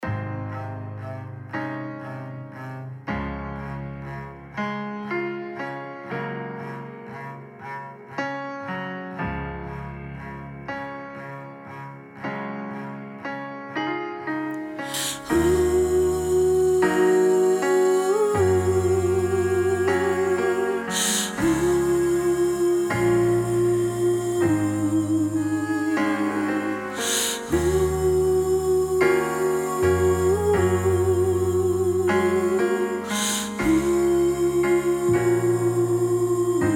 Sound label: Angel Oohs Soprano; verse 2